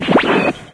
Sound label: machine